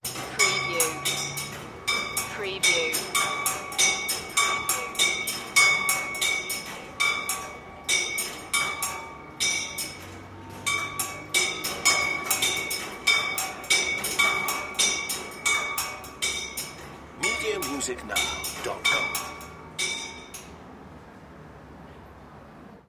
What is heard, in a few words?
metal revolving door